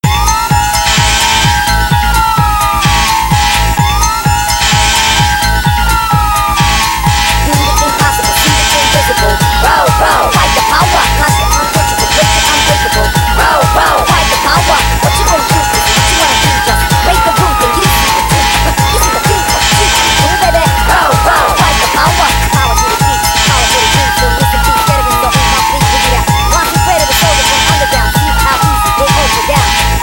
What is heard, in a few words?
RINGTONE